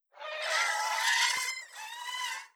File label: Hydra Vo